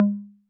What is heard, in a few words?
Conga Low 808 Sat